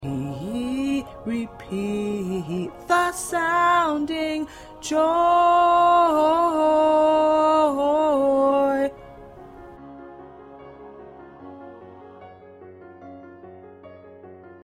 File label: Low Lead, choir